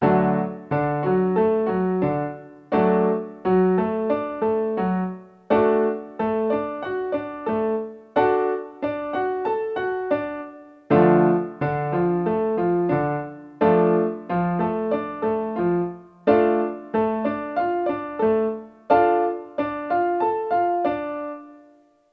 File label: triad and inversion rhythm in D and Dm